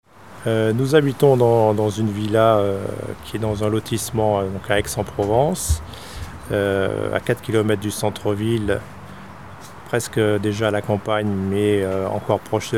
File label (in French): sentinelle synthese aix arcades